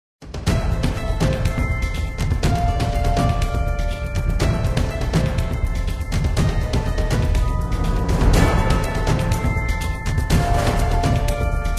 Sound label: Briarwood Combat; Free Realms 2009 online windows ps3 gamerip